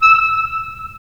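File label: wind clarinet; Roland - LA Composer vol. 3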